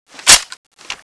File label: an94 draw, weapons